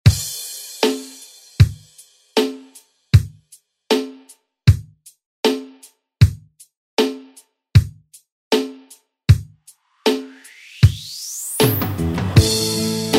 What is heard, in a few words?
90s ReDrum; DJ Mhark